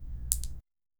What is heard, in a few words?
a bolt of lightning being, electric